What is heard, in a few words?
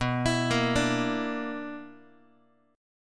B Minor Major 11th, BmM11 chord, Guitar; arpeggiated; JGuitar